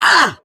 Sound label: Pain; Warframe; Grineer Catbrow Handler; Battle Barks